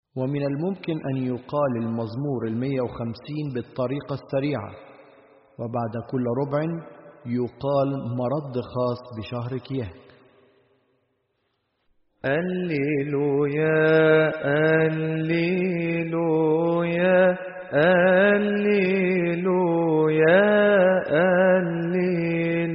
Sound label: Psalm 150 Minor With Refrain مزمور 150 باللحن القصير مع القرار